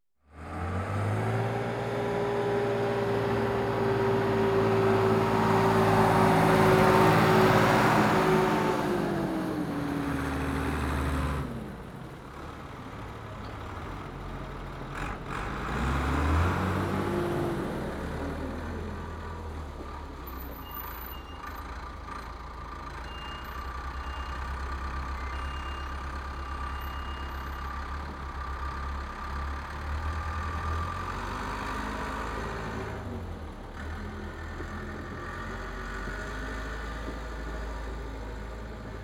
Tractor Two Ford Tractors Work; Farm Machinery; BBC Sound Effects Library

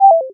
question, Interface Sounds; Kenney's Sound Pack

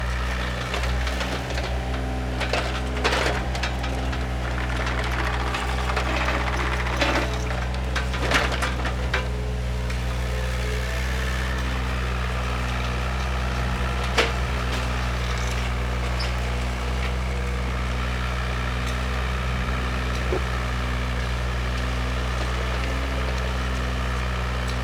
Just Ambience Construction Site; SoundBits

